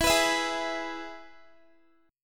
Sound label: E-Major E, Guitar; strummed; x,19,21,21,20,x